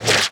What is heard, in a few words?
cannon Ball; netent